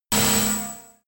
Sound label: sci-fi laser shot